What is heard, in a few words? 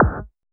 HARD Q VRB; HIQ+ZAPS